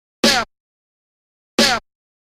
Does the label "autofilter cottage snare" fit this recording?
Yes